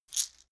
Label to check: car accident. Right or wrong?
Wrong